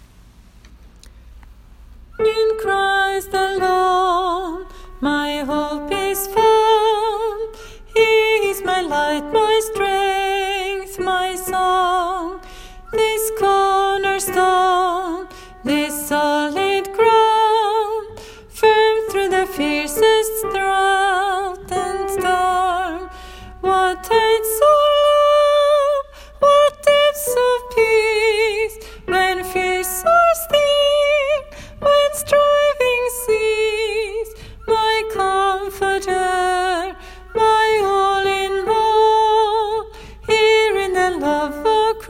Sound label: tenor; åfjord